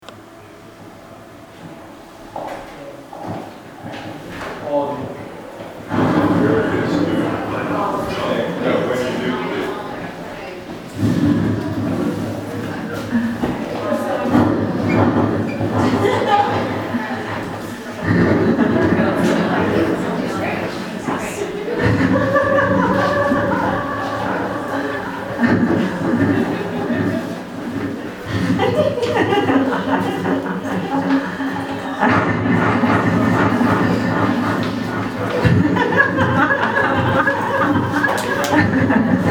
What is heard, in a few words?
Laugh